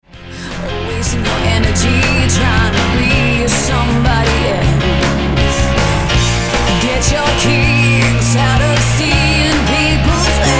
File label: Scary Girl